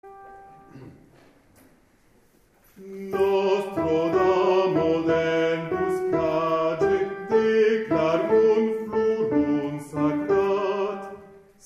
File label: Nostra Dama del Bocatge Alti